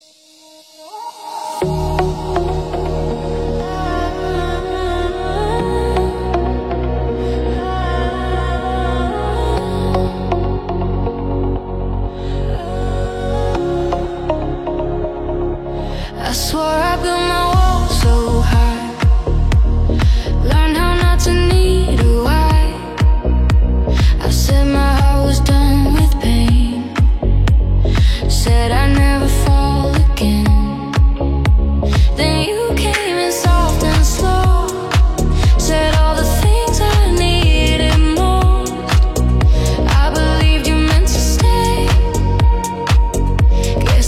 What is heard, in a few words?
house